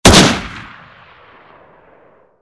steyr aug

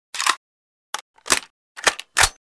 m16 load

